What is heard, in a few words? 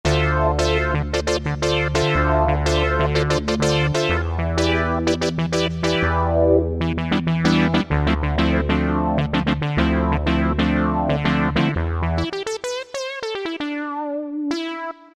Funky Analog, Yamaha PSR-3000 brass; Factory Voices